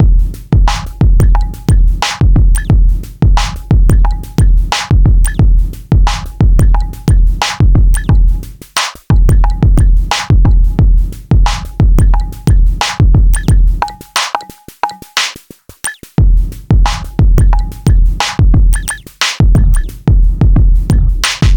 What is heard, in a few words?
Abstract Electrofunk breakbeats 089bpm